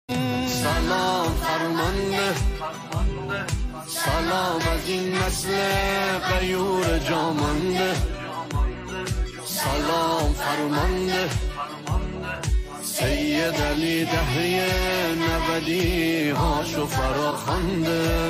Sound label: music tarana irani farsi and urdu